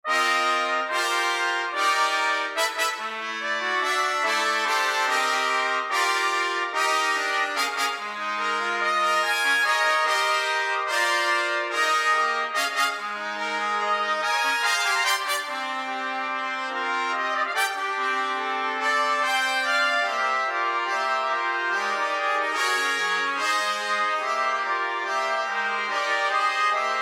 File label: Fanfare